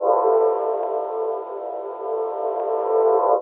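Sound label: Pad Synth; Equipped Music; Loungin House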